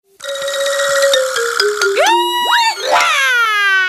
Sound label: klik klak